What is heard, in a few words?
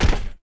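droid footstep dirt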